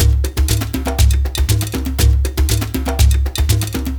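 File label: Djembe; Spectrasonics; Heart of Africa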